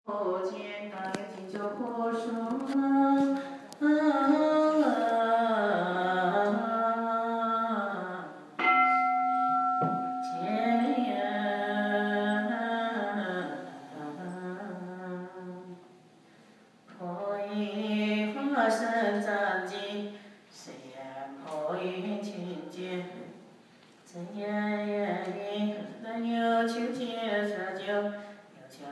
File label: Foshou chanting